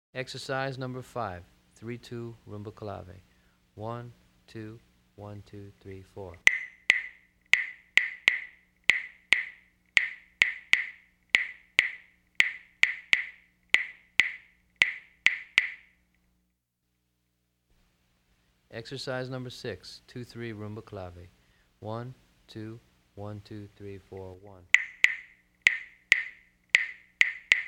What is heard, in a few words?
Rumba Clave, Drumset